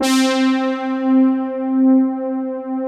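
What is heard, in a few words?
analog brass; Pro-Rec - Synthsonic; TRINITY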